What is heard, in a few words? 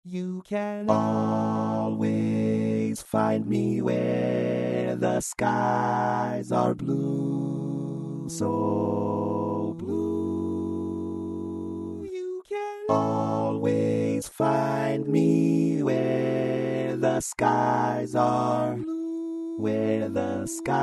Bass